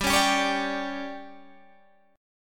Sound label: D Major 7th Suspended 4th, chord